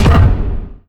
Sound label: chat tabs; ui